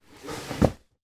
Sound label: foley cardboard box package slide bang into others; zapsplat